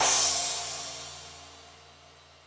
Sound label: More Cymbals, Drum Machines